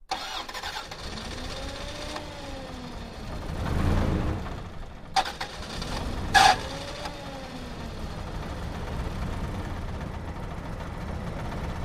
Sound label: Car; Starts With Bad Starter Burns, Labored Idles With Very Heavy Rattles, Off, Rear Perspective